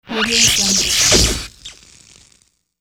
electrical whoosh; hollywoodedge

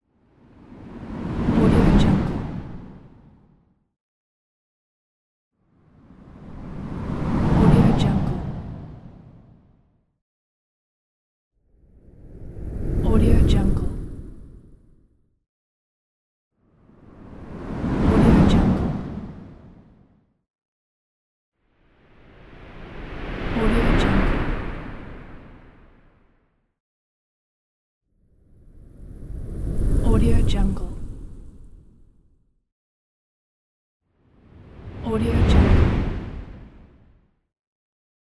wind whoosh

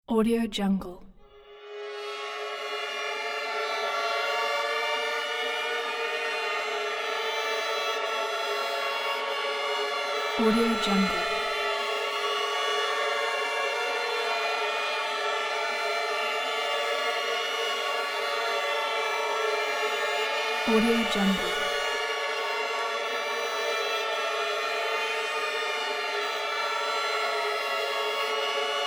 high string tension

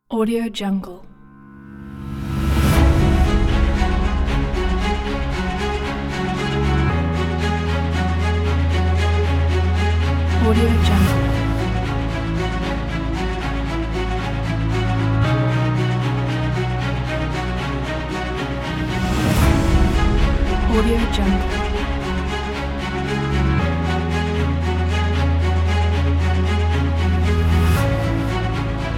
abstract cinematic kit

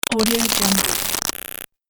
sentinel glitch

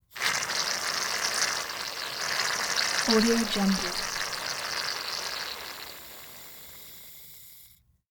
shaving cream

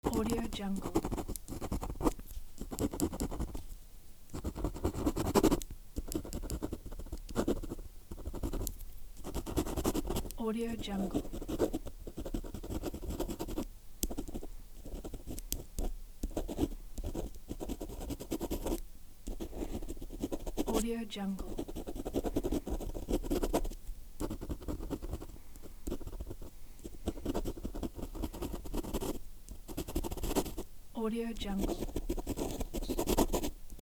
pen writing on paper

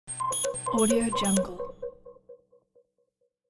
alien warning signal

